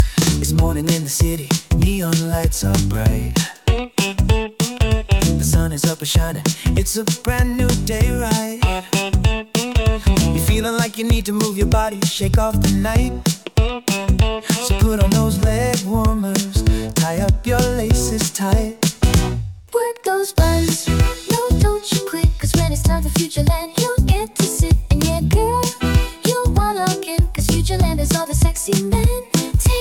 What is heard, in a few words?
80s Pop